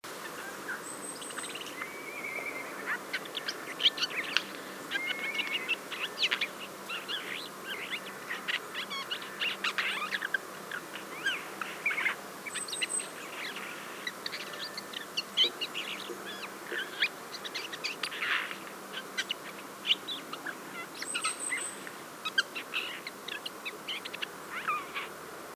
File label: Garrulus glandarius; SETE